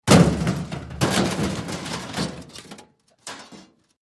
Junk Slams Metallic

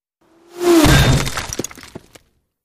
Bullet Impacts Hitti, stone; Bullet Impacts Hitting Masonry With Whir In And Debris Ver 4

